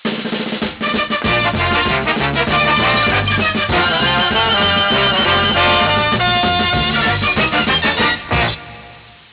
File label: instrumental, jingles